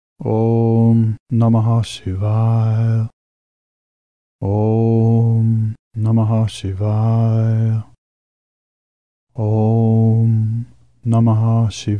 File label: om namah shivaya